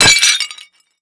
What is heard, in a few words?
pot break, explosions; Call of Duty Modern Warfare